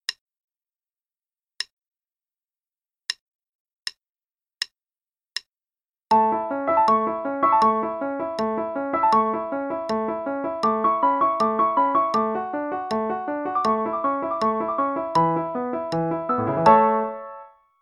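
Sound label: Play-a-long, quarter note=80; Mozart; Ronda Alla Turca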